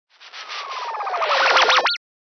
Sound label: Computer SFX long; soundbits